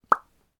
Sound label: Pop High